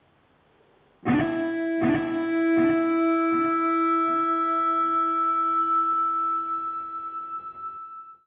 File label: Rock Alert Feedback